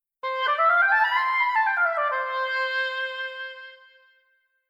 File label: scale